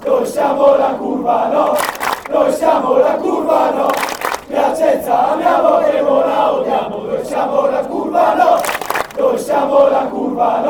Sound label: we are 27 fanchants